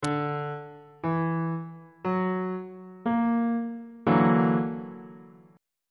f sharp augmented 7th 2nd inversion

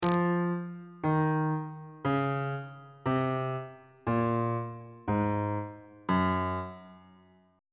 f sharp lydian mode descending

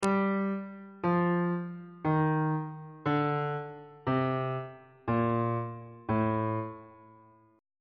a locrian mode descending

